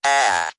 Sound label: "ah", robot; Voice; ooh ahh